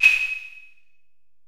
808 CLV, 480L; Wizoo - Platinum 24 Electronic; 480L SP 808

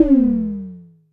vermona drm1 mk3 tom

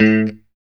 POP A 2, STRAT guitar; Spectrasonics; Hans Zimmer Guitars VOL-1; JC-STRAT POP

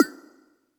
Percs, Elektron MachineDrum; SPS1 MKII

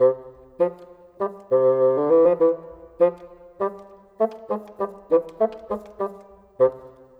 Bassoon, Orchestral; Sonic Foundry (Sony Creative Software); Orchestral VOL-2

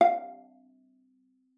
F5, VIOLA PIZZ; Xsample VOL-5; 90 s Sample CDs

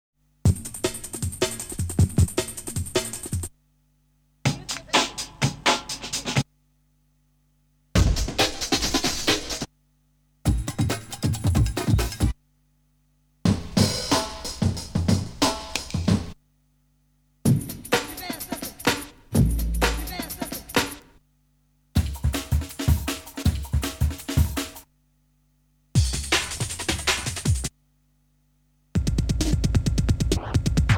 Breakbeats; Kniteforce; 1000 Samples Volume 1

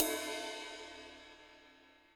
HiHat & Crash, Roland TD-20; HiHats

